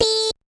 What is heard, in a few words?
beep